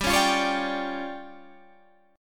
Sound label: D Minor Major 7th Double Flat 5th, G